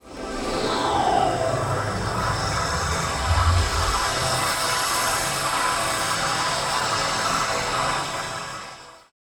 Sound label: sfx sci fi; Masterbits - Switch FX